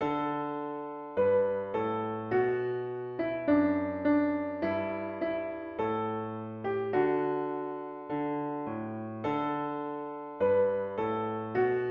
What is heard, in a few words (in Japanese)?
ピアノ